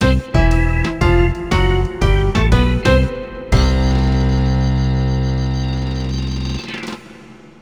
Level Clear; Space Station Silicon Valley; N64

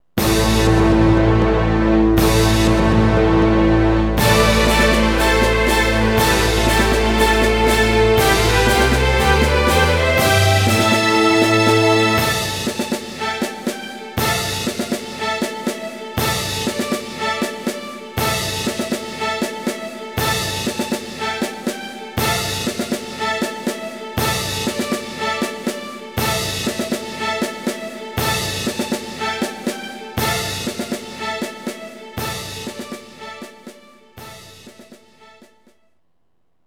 victory; Pride FC Fighting Championships; PS2

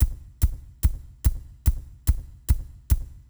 Intro Drums

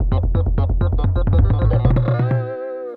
Lucky Drum Spin; Juicy Realm

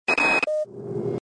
Beep Radio; escape the health center; RADS

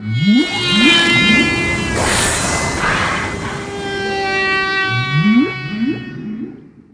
Amb Whale; Kelly Slater Pro Surfer

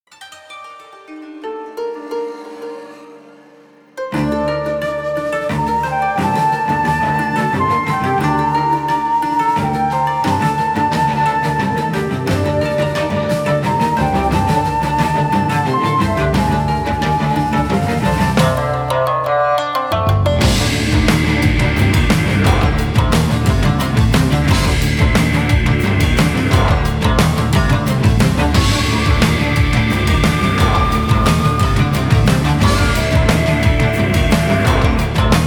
music boss intro; Aragami

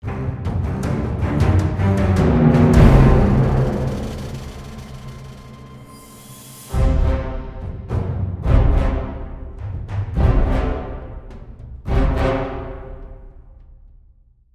Soft Action Idle; Brothers in Arms 3: Sons of War; Android

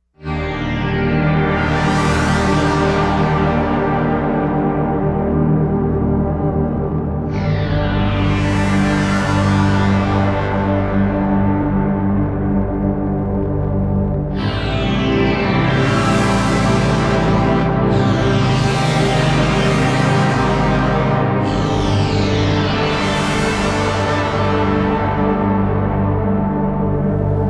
City Ruins Stem; Epitasis

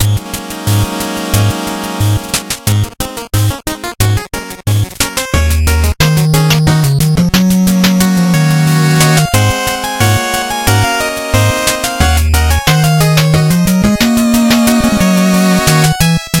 Music Card House Mountain

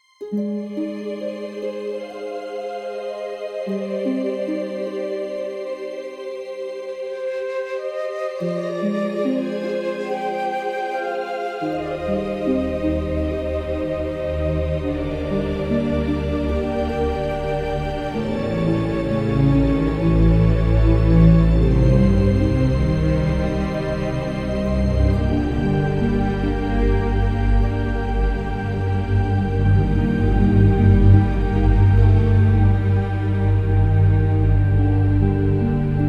Music Roll Angelic; World of Warcraft 6.1; Garrison Jukeboxes

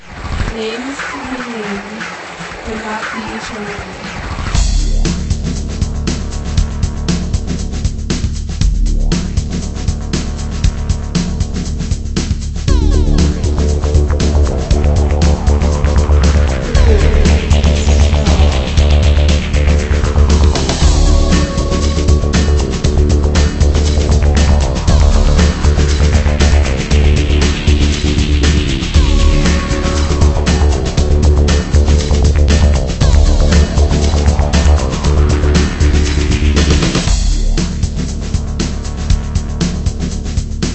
Menu Loop

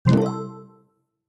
Star Grab

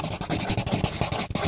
Crayon Loop

